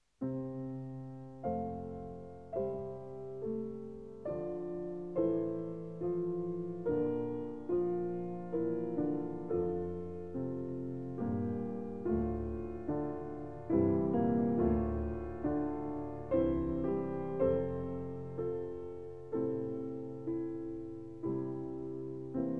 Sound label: solo piano piece; songs solo piano piece fifth of six songs, Romance (Brahms); Legend of Galactic Heroes; Free Planets Alliance SIDE